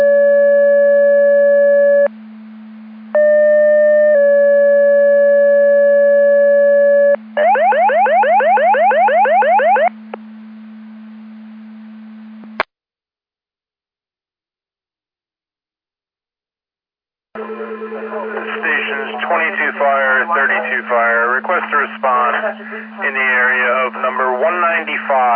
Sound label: Siren; Andover Twp Boro